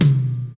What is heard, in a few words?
reverb tom drum sound c key